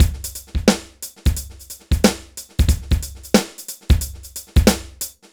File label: BPM 90 DRY